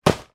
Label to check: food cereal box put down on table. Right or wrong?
Right